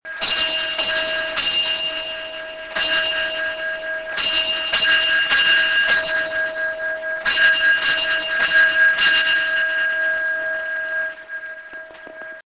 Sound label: gong